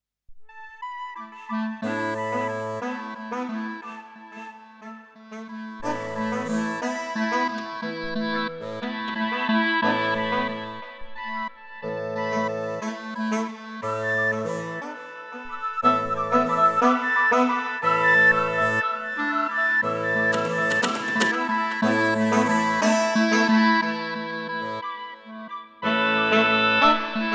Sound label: Beetlecrab Tempera; Classic Quartet